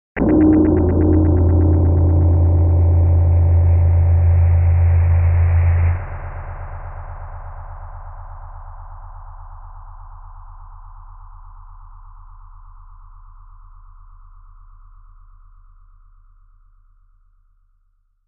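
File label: Impact Electric Pulse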